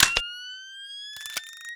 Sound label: nvg on